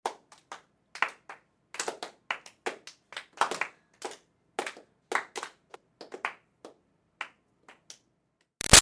Crowd Small Claps Few Awkward; Interior; Applause; Big Room Sound

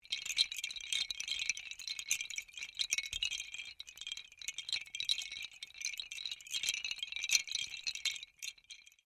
Windchimes Bamboo Small Long

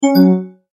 multimedia tone end; McKinney Sound

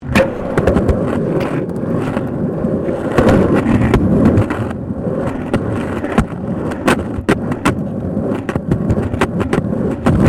SKATEBOARD UNDERSIDE ASPHALT SMOOTH; Skateboard sounds; Skateboarding; Sports

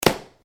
Branches Dead Snap

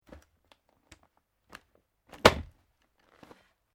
SKI BINDINGS BOOT LOCK IN; Snow Skiing; Skiing and Snowboarding; Sports

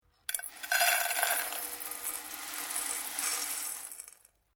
Bowl Metal Filling Dry Food Stuff; Various kitchen bowls

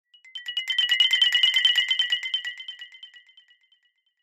Xylophone Twinkle, Xylophone Twinkle Swell High; Mallet Sound Effects; Cartoon; Blastwave

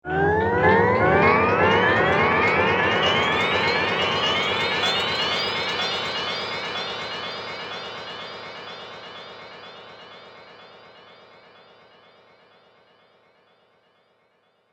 Teleportation Device Sound; science fiction sound effect

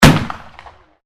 MUSKET FLINTLOCK 54 CAL 1840 REPRO FIRING

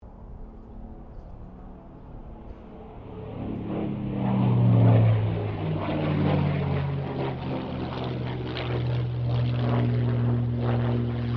AIRCRAFT SUPERMARINE SPITFIRE MK-VIII PASS; Airplanes; Vehicles

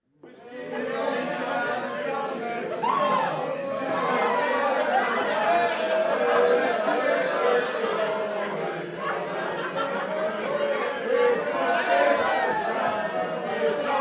Pub Crowd Lively no modern sounds; drunken singing - good for 1840s; Bars And Club; Tom Hutchings

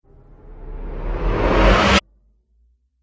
Cinematic Riser Abrupt Stop; Rise And Dive Sound Effects

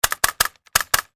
Industry Electric Staple Gun Five Times; Staple Guns; Machines; SFX Bible

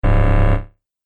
Game Low Fail Tone, Video Game Sound Effects

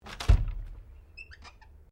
Door Pivot Interior Wardrobe Louverd Open; Various doors

